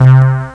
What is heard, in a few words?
dub gun; kampf; botz